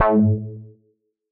Bass A2; radiosonics; Policebox Bass

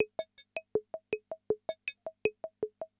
CR78; Best Service; XX Large Most Wanted

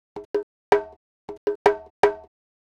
Conga, Latin Percussion; Sonic Foundry (Sony Creative Software); Joe Vitale Latin Percussion

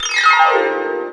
a#3, Harp Gliss, AKAI S-612 + S-900

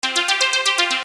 Arpeggio 120 C, Techno Synth Loops; Sonic Foundry (Sony Creative Software); Signals I

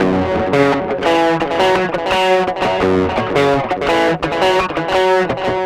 Guitar, Trip-Hop Loops; Sonic Foundry; Rondo Brothers

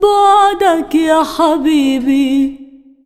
SINGER, ArabianTraditions; USB Soundscan vol.43; AKAI

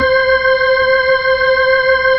B-3, Keyboards; Keyboards of The 60's and 70's; Full Xfade

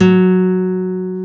NOTE, Acoustic; Sound & Vision; Gigapack I CD 2; GUI ACOUST.; GUI Acoustic 1

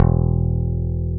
BAS E1; Best Service Gigapack 2

